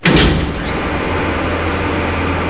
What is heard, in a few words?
elev 1, elevator; SINDEMO; environment